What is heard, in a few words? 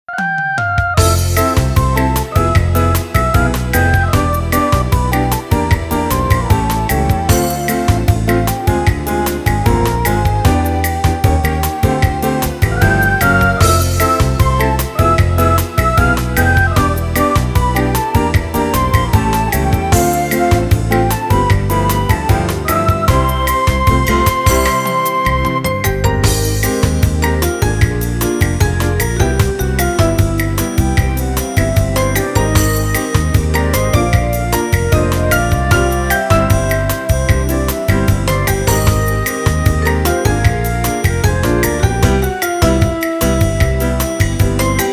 Music Event